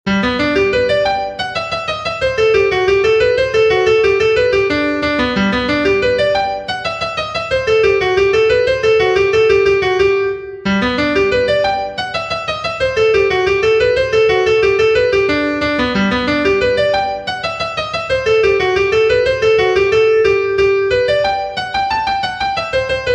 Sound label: REEL, fiddlers; southern kings fiddlers; LAKESIDE